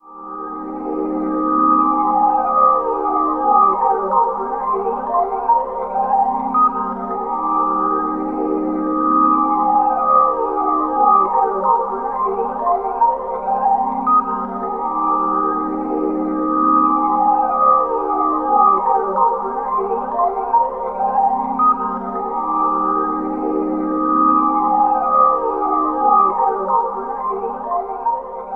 Glass Flow D#, Ambient; Zero-G Seismic Frequencies; 90 s Sample CDs

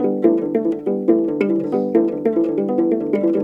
HRP AF HRP, Afro Harp Phr

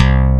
TX 802; E-MU Producer Series Vol. 2 – More Studio Essentials; FM Polybass

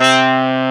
TRMPS SAX C3; AKAI S-Series CD-ROM Sound Library VOL-2; TP+SAX2

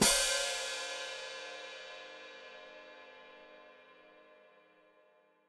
Hat Closed Open, Hi-Hat

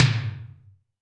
Tom Lo Stereo, Alesis D4 drum machine

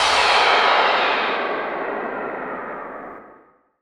NOISE, Production Effects

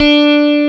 D3 TELECASTR, BassGuitar, EL GT; AKAI S6000 CD-ROM - Volume 1

